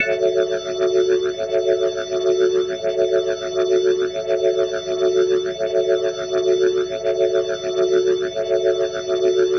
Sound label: Glowing Organ F# Min7, Rock, Organ Licks